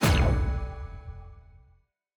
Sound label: level up